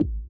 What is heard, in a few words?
THROAT; Spectrasonics; Metamorphosis; THROATERS